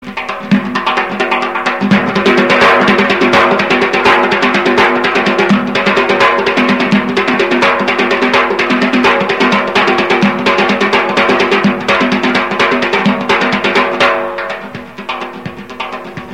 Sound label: Zarb Zoorkhane